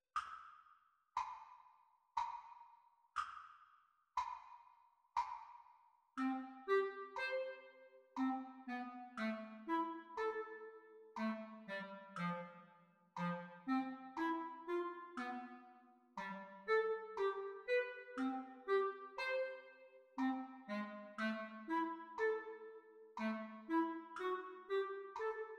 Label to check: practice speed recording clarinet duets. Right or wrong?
Right